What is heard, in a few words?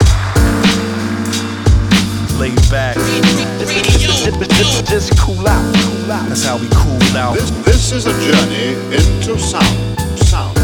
Radio Jingle